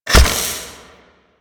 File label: Archer Rain Of Arrows Impact A; Warframe; Duviri; Duviri Dax; Abilities